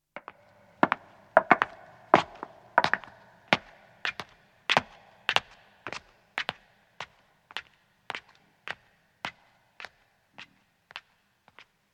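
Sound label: Footstep stone ascending, 2 women depart; Footsteps (Sound Effects:Human); BBC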